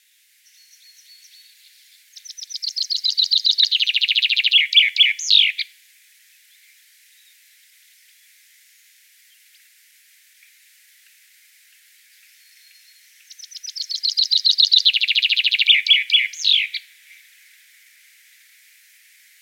Buchfink Gesang; Wiesloch

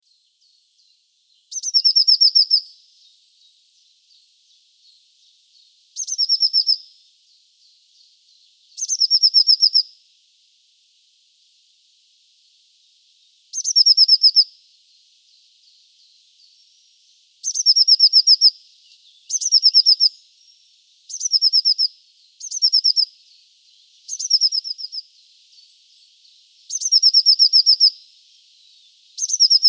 Blaumeise Gesang; Wiesloch

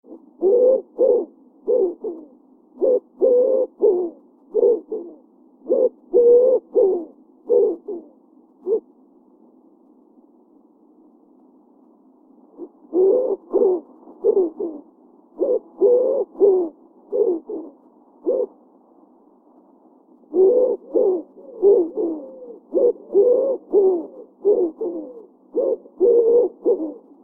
Ringeltaube Gesang; Wiesloch

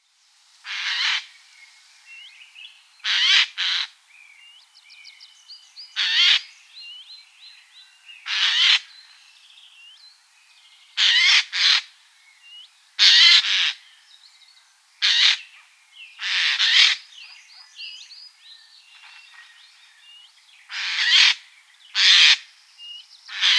Eichelhäher Rätschen; Wiesloch

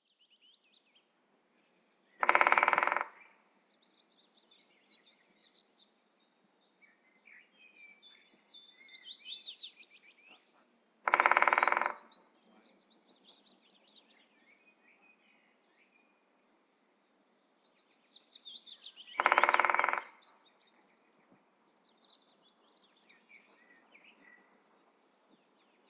Buntspecht Trommeln; Wiesloch

